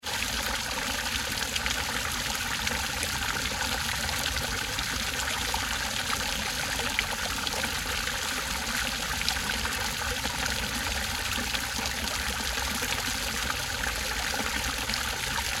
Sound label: Recreation Park Gardens Large Water Fountain Flowing Bubbling Constant